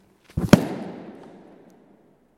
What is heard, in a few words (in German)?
kirchenbuch zuschlagen